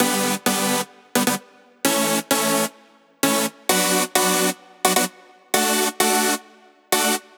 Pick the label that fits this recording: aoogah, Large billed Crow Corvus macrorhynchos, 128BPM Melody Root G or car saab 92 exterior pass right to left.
128BPM Melody Root G